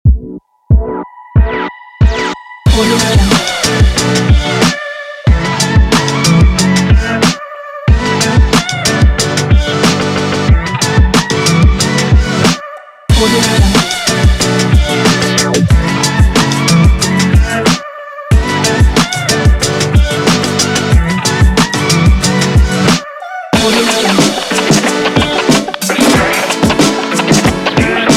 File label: the hiphop